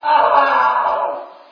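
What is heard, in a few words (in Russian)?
вопль одиночный мужской короткий шумный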